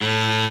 cello

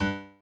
piano chord

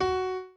piano chord

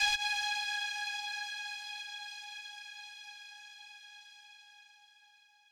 lead

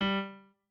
piano

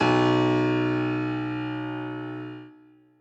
bass piano

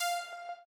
arp

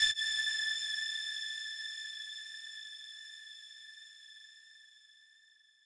lead3

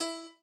piano

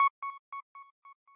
arp

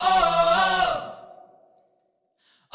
Key chant; Luxembourg Zwee

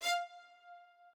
strings

